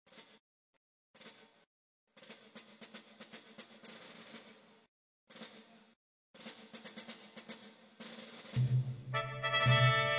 Brass Choir

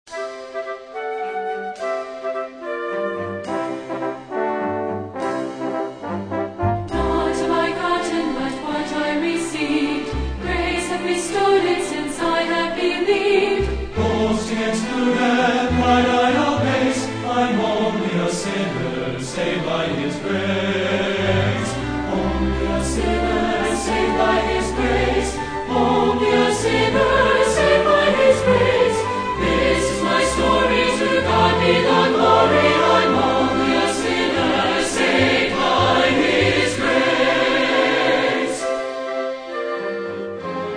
Singers With Orchestra

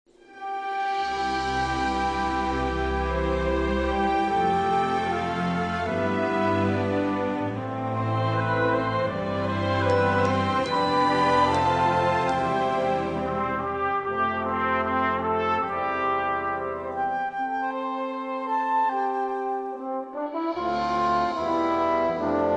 Trio, Faithful Servant

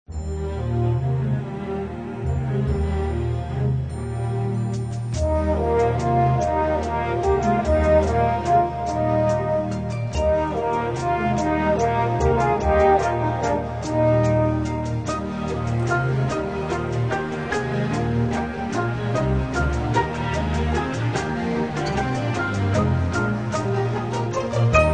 Orchestra Panpipes

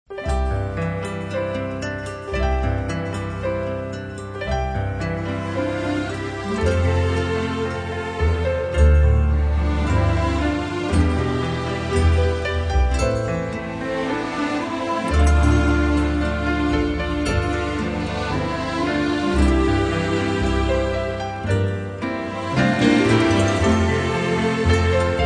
Majesty Strings

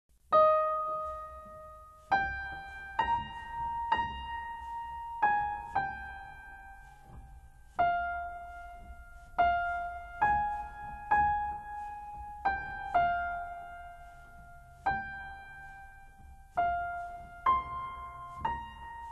my faith looks up to thee piano solos

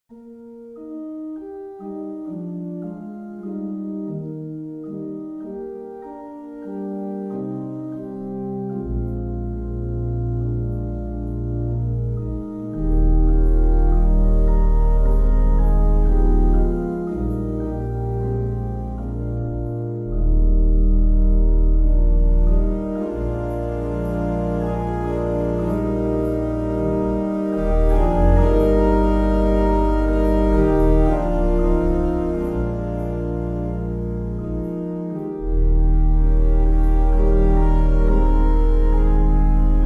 organist, medley; abide with me

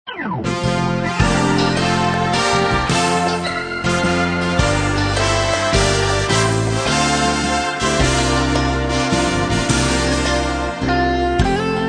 Instrumental Hymns

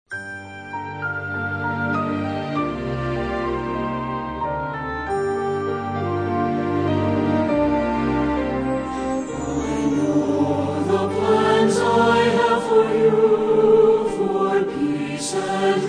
Choir And Orchestra

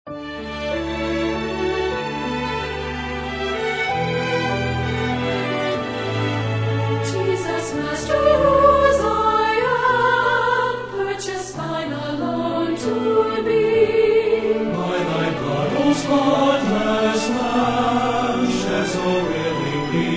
Singers With Orchestra

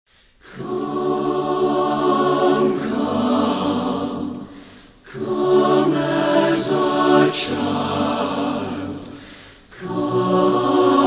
Choir And Orchestra

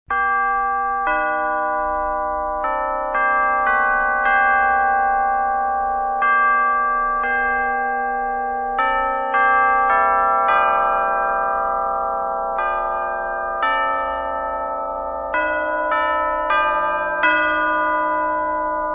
Cathedral Organ And Chimes

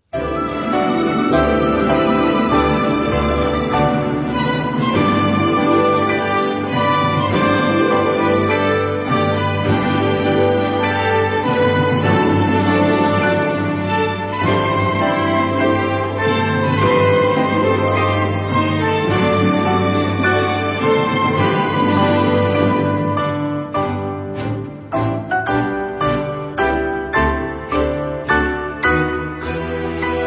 Orchestra

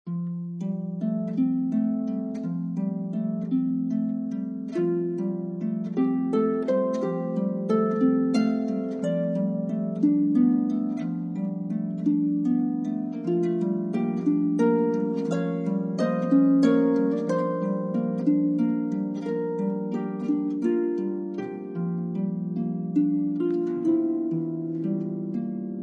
Harp Hymns

